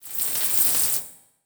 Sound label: Transition Electrical Surge; Production Element Title Transition